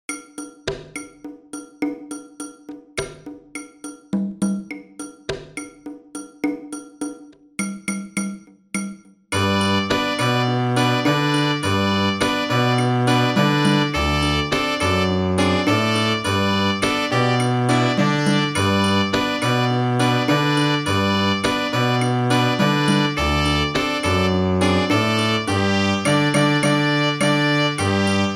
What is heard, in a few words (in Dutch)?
rondu langzaam; meespelen; cuba; luisteren